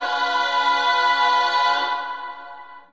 Slavic Choir C sharp 7 Ohhh, Slavic Maybe Bulgarian Choir